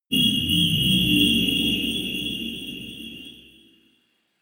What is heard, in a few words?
demon voice transition